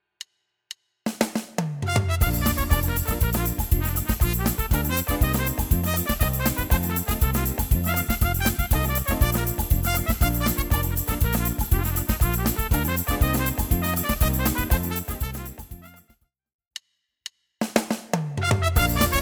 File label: Mexican Hat Dance Tyros 5